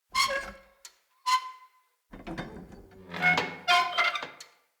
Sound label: Light Mirror Hang Squeak E; Puzzles